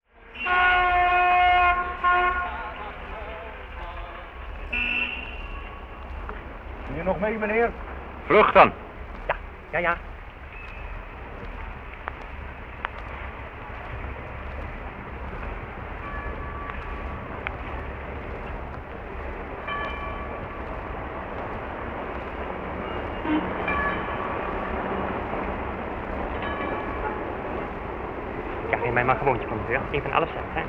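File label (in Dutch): tramrit Amsterdam praten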